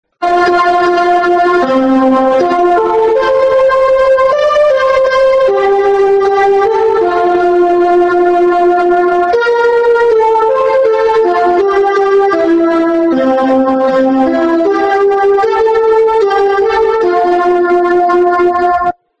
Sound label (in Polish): hejnal wielunia